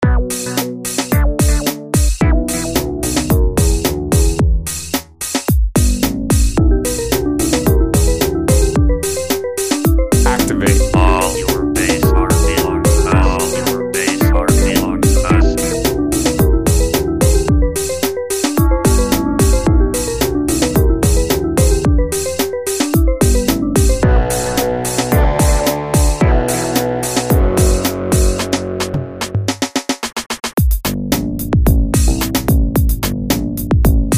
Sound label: cool beat